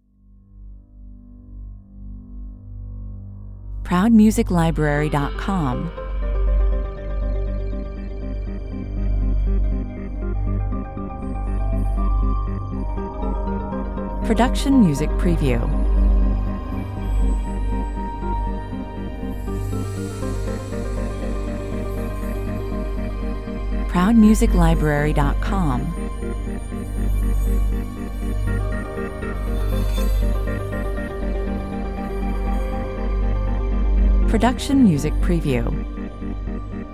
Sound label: rough water (with melody) 120 bpm, Worry and anxiety is the vibe of this slow modern ambient track